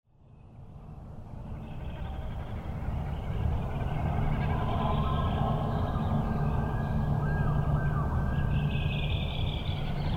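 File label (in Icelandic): himbrimi þrestir og hrossagaukur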